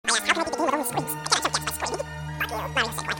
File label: screams